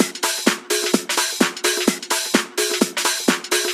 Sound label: House Loop, 128 BPM; Vengeance Samples